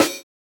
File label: Snare, jungle breaks drum sounds